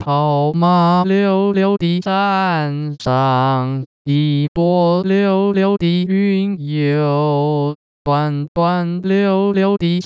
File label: madrigal male, vibrato; KangDing